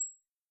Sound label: ui click